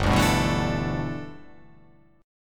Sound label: G 6th Add 9th G, chord